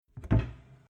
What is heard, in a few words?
Household Domestic Kitchen Cooker Arga Opening Oven Household Door Single